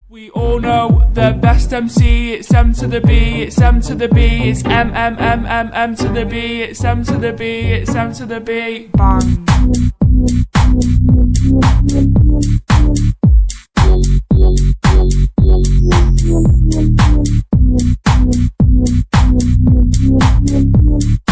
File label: slowed; хип-хоп